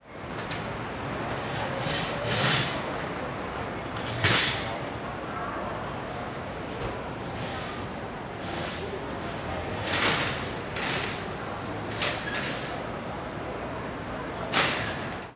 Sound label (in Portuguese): Carrinho de Malas do Aeroporto sendo Guardado; equipamentos